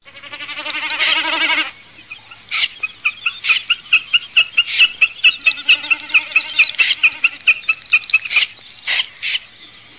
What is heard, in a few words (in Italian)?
BECCACCINO CROCCOLONE